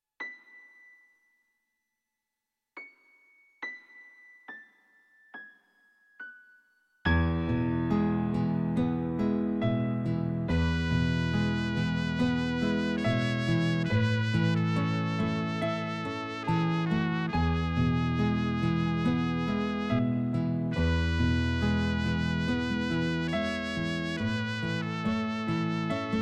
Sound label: Clasiko Istrumental 1550-1900